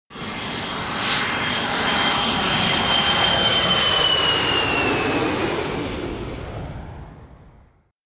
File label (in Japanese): hikouki